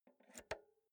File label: insert jack multi socket